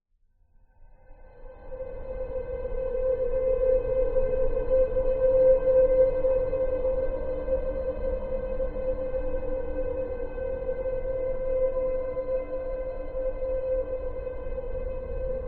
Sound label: science fiction drone deep dark whining shrill; zapsplat